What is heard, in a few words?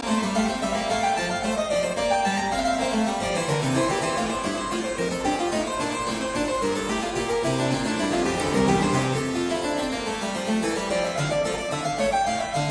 French Suite No 5 in G major BWV 816 II Courante